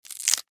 Food Peel Tear, Foley Food Peel Rip Tear Short Vegetable; Household; Blastwave